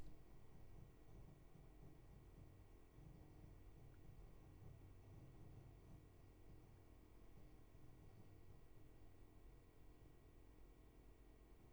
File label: roomtone